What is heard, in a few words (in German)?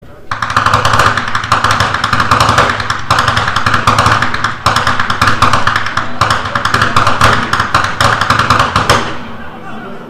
schnalzen; steinbruenning; saaldorf